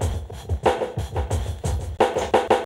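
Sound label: back beat, hip hop; LiveAct Pool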